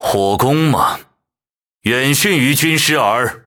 Fire Alarm; Liu Bei; Alarms; World Of Warships; Three Kingdoms

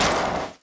grunt sheetmetal run

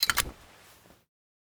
double shotgun close back; Rust

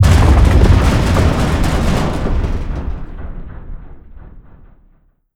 explosion sub hit shake; Call of Duty Modern Warfare

